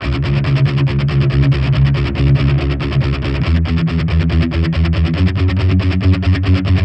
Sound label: Distorted Guitar 140BPM C#min; Vengeance - Essential Clubsounds Vol.4